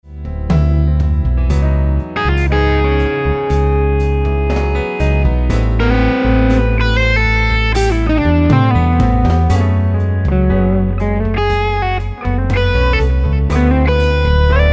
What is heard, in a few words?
Samick Open Back